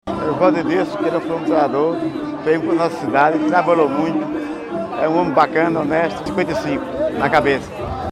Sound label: vocals